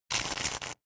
Horror Accent Short

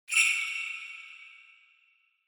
Bell Accent Bell Only

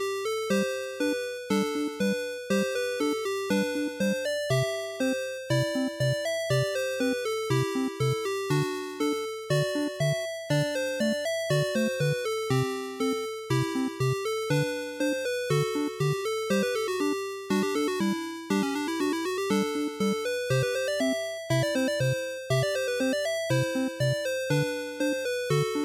NES General Title Loop 120

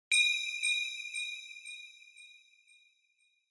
Single Accent High Delay

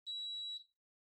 Air Purifier Far Beep

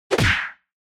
Hit Punch Low

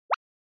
Anime Motion Single

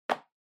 Tablet PC Multi Touch

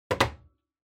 Power Strip Put

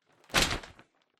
Newspaper Open

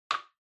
Bingo Machine Master Board Ball Put

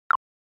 OS Sound Notification

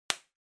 Bubble Wrap (Pop Close); 気泡緩衝材; プチッ 近い; 梱包材

